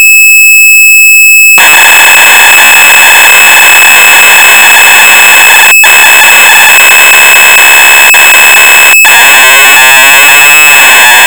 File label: Cave